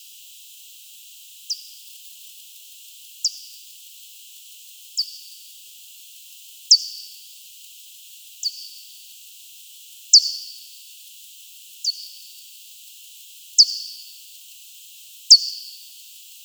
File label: P maderensis, Tenerife; Las-Lajas; J-Barataud